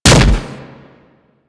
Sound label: Impact Flesh, Weapons; Warframe; Furax